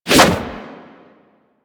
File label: Prime Ankyros Whoosh B; Tenno